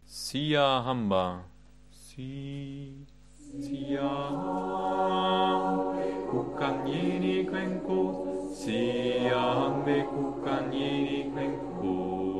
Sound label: Bass; Siahamba